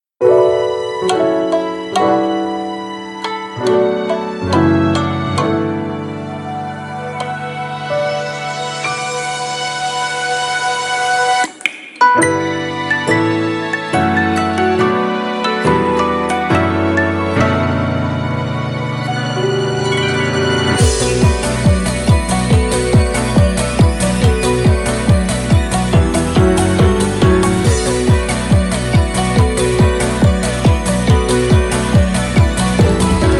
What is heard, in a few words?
Shamisen Drives the Wind; Z-I-v Summer Contest 2016